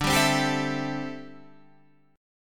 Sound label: D 7th Suspended 2nd Suspended 4th D, Guitar Standard; 10,10,10,12,10,12; D7sus2sus4 strummed; capo=3